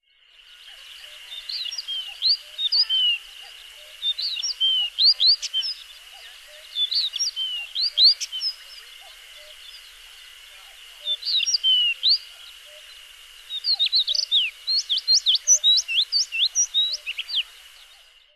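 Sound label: Stejneger's Stonechat; nagano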